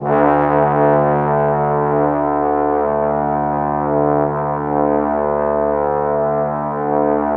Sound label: Trombones, D2; Standard Instr